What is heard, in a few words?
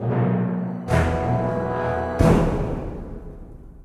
Get Skill